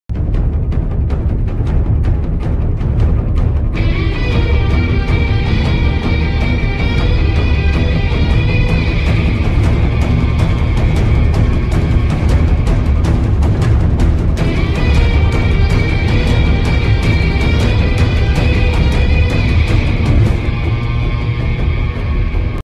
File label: ringtone; wonder woman